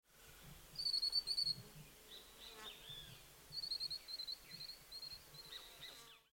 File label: Green throated Mango, پرنده